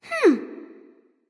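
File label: thinking, nonverbal; smite; voicepack; khepri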